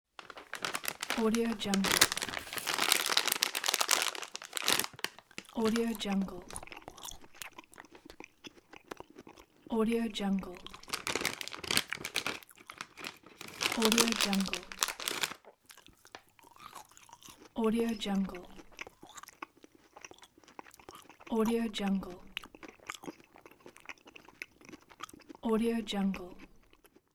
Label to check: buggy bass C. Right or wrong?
Wrong